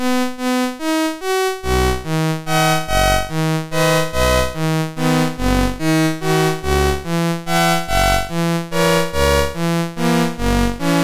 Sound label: Digi Hits, MOS6581R3